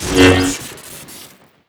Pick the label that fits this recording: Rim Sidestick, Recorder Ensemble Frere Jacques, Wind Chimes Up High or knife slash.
knife slash